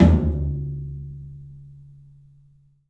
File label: 3-D Percussives, LOW Toms